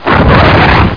MISSILE; tv3d

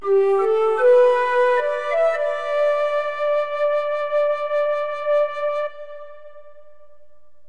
FLUTE; anito

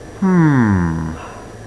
ponder, People; Sound Library 2000; Wayzata Technology

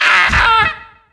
harpy hit; DAoC

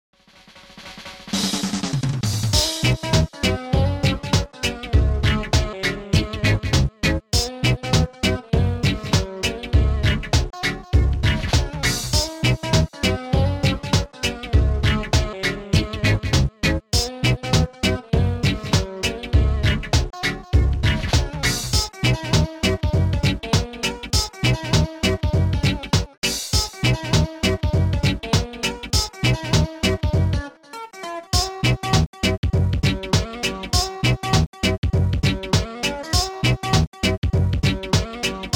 jamming, soft blues; Digital Symphony Collection

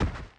step; DAoC

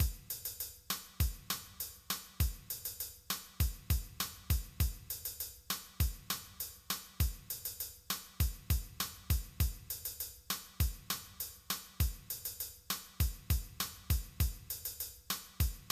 afro cuba

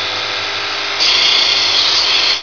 SHARPEN; Dictionary for Children

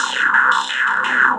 rez beat; Shogo

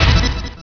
Shield Pickup; Spectre Supreme Demo

